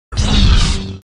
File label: Saber Hit